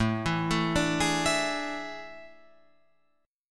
A Major 7th Suspended 4th, Guitar, Open F; AM7sus4; arpeggiated

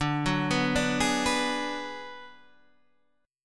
D 6th Flat 5th D down Guitar Standard, chord; D6b5 arpeggiated; JGuitar

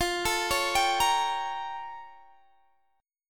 Bb Minor Major 13th

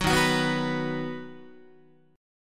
D sharp Minor Sharp 5th D sharp down Guitar; strummed; D#m#5; 9,11,9,9,10,10; JGuitar

